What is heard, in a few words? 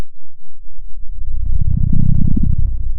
pop, Sound of a dinosaur